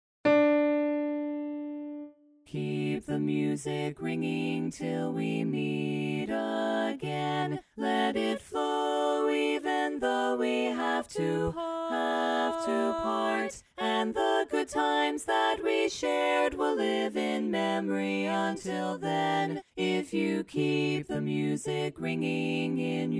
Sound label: Four Part